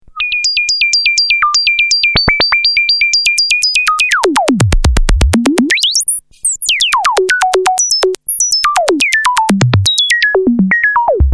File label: waldorf pulse